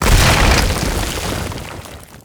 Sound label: Magnetic Manipulation Impact; Infested; Warframe; Infested Lich; Drill Bit